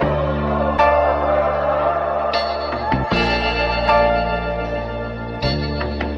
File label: piano; Phonky